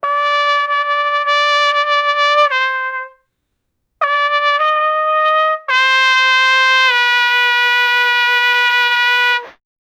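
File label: a slow trumpet funny music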